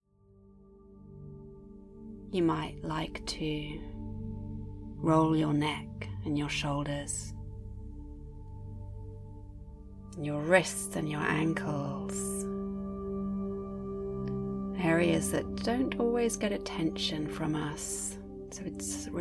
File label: Easy Relax